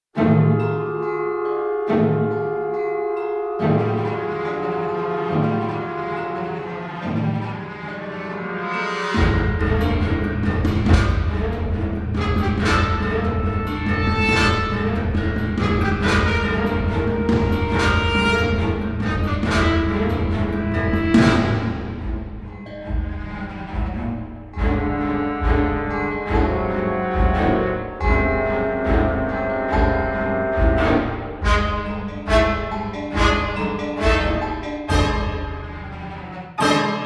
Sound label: muted horns